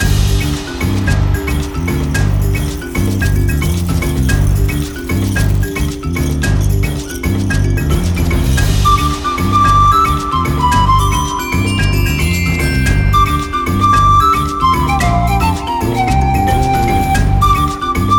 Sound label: Exotic loop